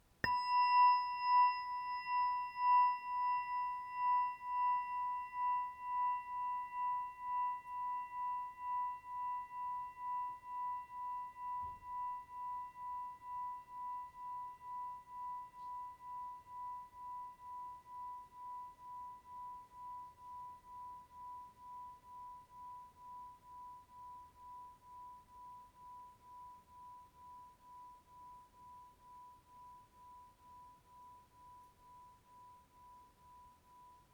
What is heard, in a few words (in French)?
cloche 7cm